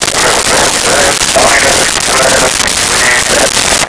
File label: Police Radio Chatter; Communications